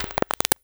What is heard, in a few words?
BUGS, ORGANIC; USB Soundscan vol.33; AKAI; Disjointed Textures